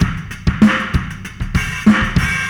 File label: Fast 16 096, Beat Loops; Zero-G; Monster Beats